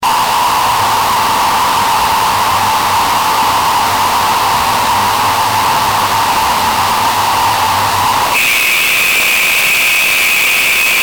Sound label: a jumpscare that so loud